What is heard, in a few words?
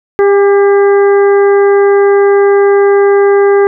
composite 400Hz dynamic phase shift fine; KSP-440; KSP-400 次期モデル・オープン開発